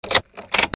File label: CARS